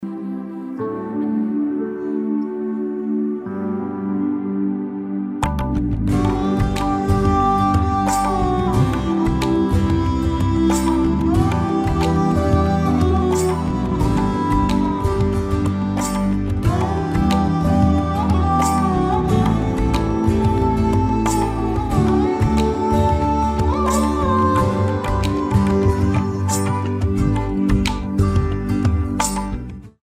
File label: Karaoke Song